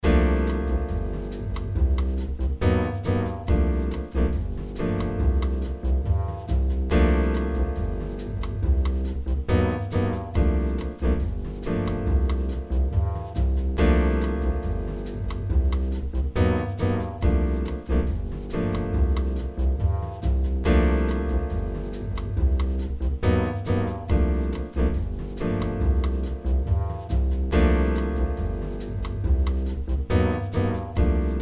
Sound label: Dominant7sus4